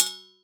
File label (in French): casserole; In the kitchen; 96khz 24b mono